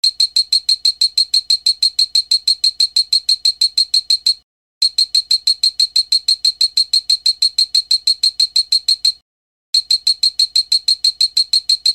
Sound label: tiger thrush